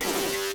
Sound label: hurry; frozen-bubble